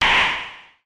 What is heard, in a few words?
FX SHOT TEKNO 140; Techno2; Singleshots